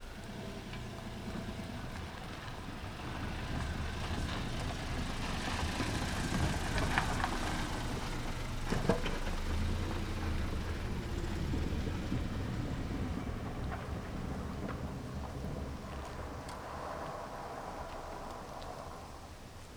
Carro devagar em estrada de terra estaciona e bate porta; maquinas

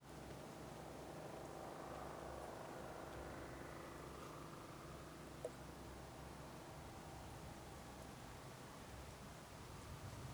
Ambiente na estrada com muito vento e som de folhas

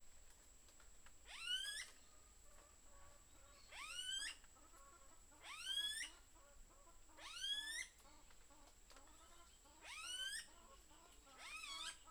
Galinhas, passaros e grilos em ambiente rural a tarde com passos na grama; aves

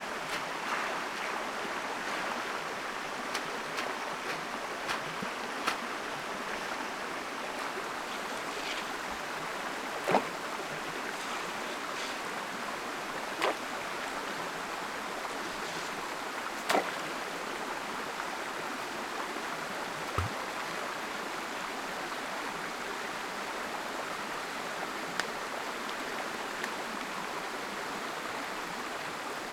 Cachoeira do carreiro de manha jogando pedras na agua

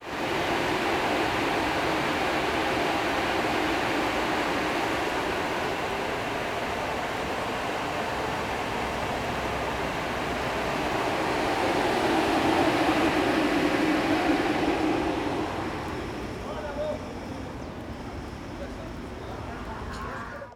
Carros passando no Setor Comercial Sul com muito ruido; transito

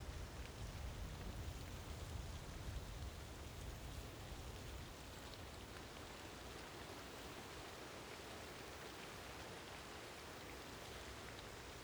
Floresta de arvores com bastante vento; terra ronca goias

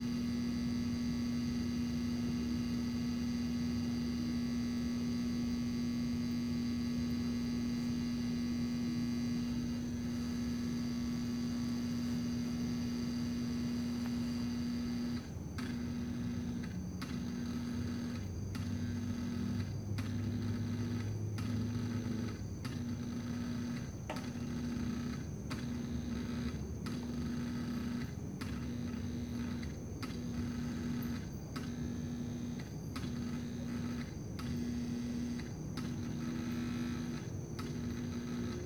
Transformador eletrico ruidoso com falhas a noite, morcegos e transito longe; colecao sonora do cerrado

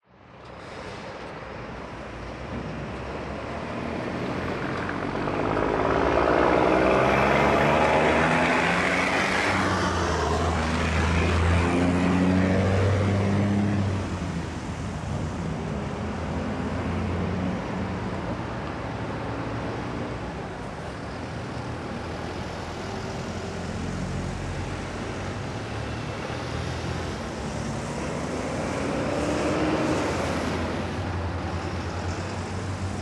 Caminhao de Grande Porte Saindo e Acelerando; maquinas; colecao sonora do cerrado

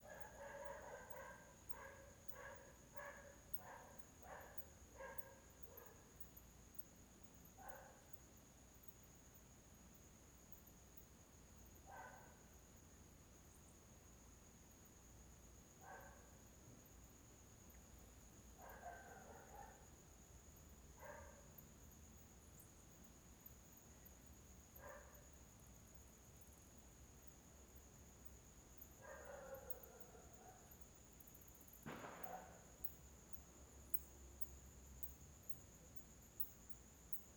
Ambiencia quintal de cidade pequena de noite com morcego, cachorro latindo; sino; ambiencia domestica

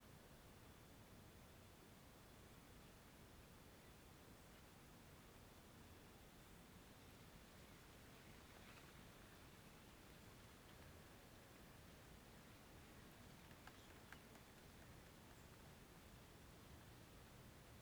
Ambiente natural a tarde com arvores, ranger de madeira e morcego

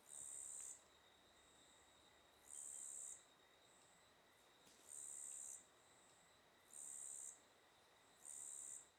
Grilo Diferente e Agudo como Serra; insetos

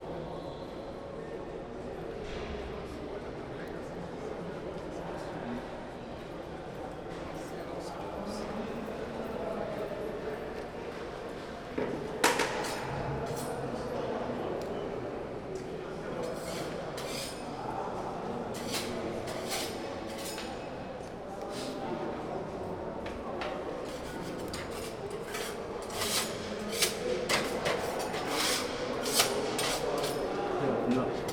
Corredor reforma espatula emassando a parede; equipamentos

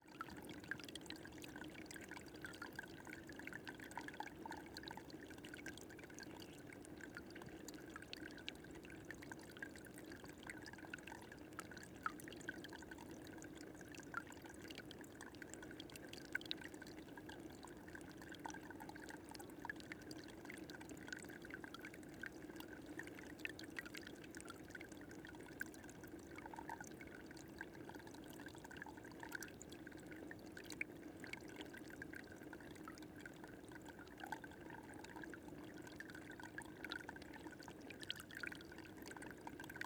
Nascente de agua bem pequena captada muito proximo; Cerrado

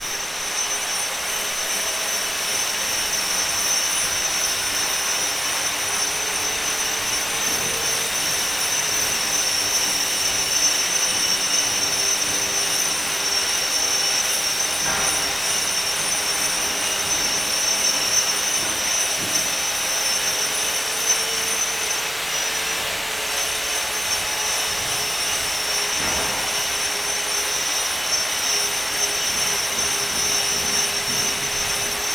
Furadeira quase constante obra com algumas batidas; maquinas; canais-stereo; colecao sonora do cerrado

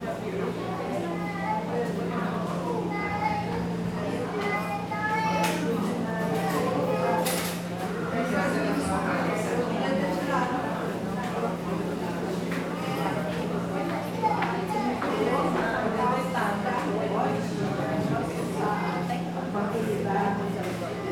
Vozerio dentro de padaria com crianca e refrigerador; multidao

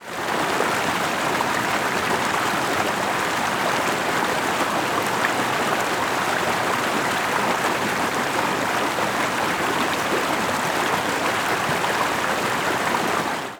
Pequena corredeira de agua

